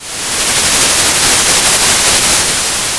Sound of a thunderstorm

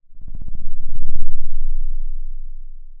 pop

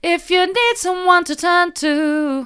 if you need someone to turn to soft, vocal

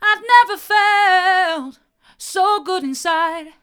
never felt so good inside hard, vocal

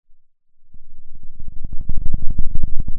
pop, Sound of a thunderstorm

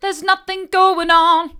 theres nothing going on mid, vocal

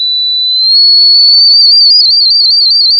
pop, sound of a tea kettle whistling

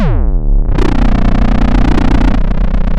pop, chainsaw

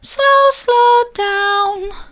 slow slow down soft, vocal

